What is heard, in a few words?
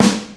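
sharp steel snare drum a key, tuned to the A note; snare-drum-samples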